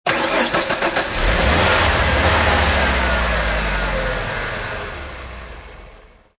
Truck Ford F150 Start Up Engine Perspective, Ford F150 Truck. Ignition; Auto, truck, motor startup; Car Sound Effects